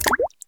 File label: water, Sound Effects